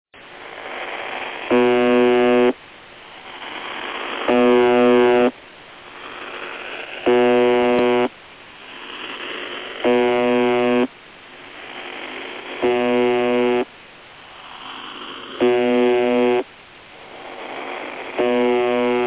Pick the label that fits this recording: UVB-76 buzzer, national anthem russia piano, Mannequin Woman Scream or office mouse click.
UVB-76 buzzer